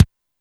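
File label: kick Pillow, Alesis D4 drum machine; 300 Drum Machines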